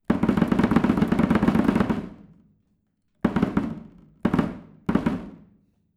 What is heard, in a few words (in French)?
Tambour de manchot